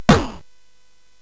SFX; Narco Police; hvsc

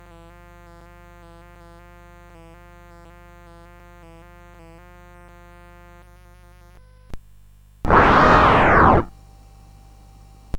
Tiger Growling, CSG8580R5; SoundEffects

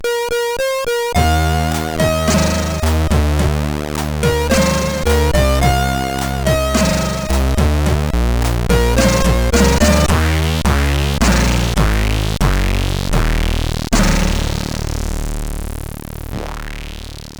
Jingles, sid CSG8580R5; Freeway 86

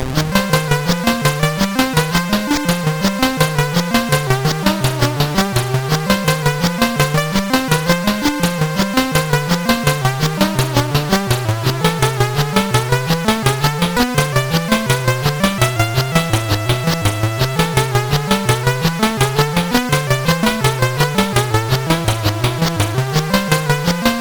Solomons Key, CSG8580R5

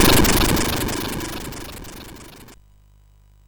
sid MOS6581R4

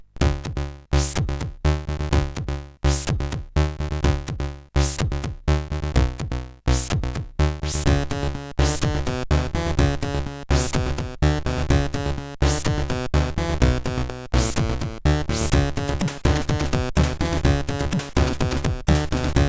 Noise, CSG8580R5

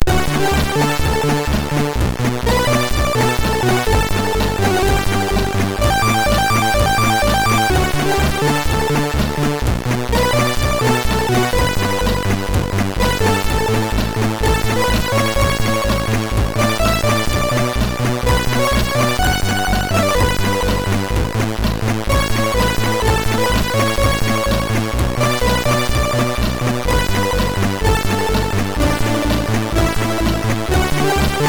Cat Rap, MOS6581R2

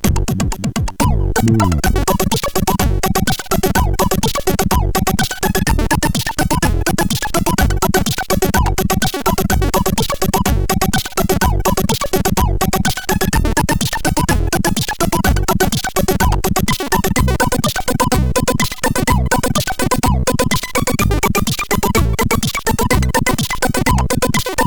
CSG8580R5; hvsc

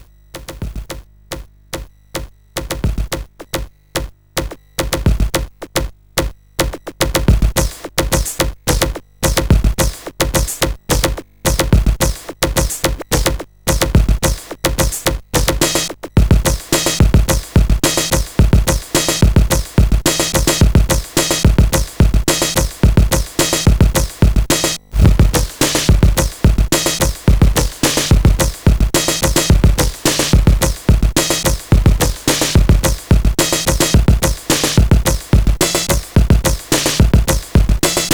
Musik Run Stop, CSG8580R5

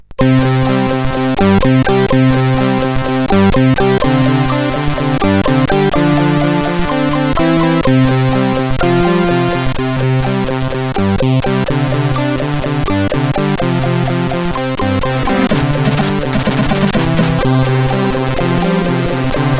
Funky, CSG8580R5

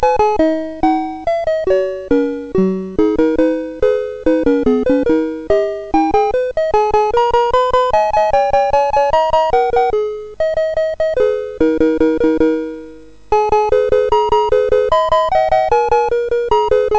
Dragon Wars, MOS6581R4

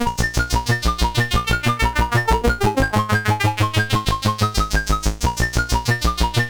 Chords BASIC, CSG 8580 R5; Galaga

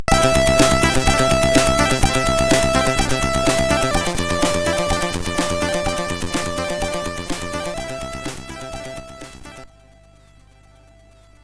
Hires Demo Kit, CSG8580R5

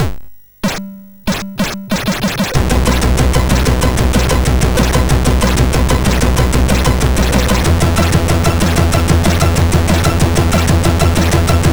Intro Tune, sid MOS6581R3

